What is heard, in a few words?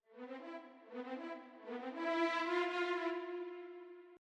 Vn1 A; Verdi